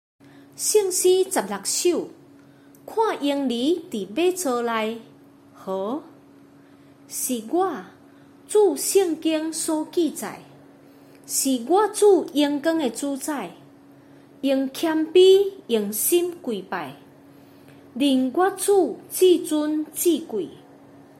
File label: chorus, hymns for christmas; see the babe in manger lying